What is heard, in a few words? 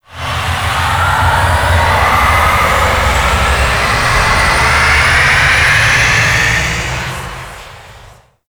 extraction depart, vehicles flight; Warframe; Liset; Stalker Liset Skin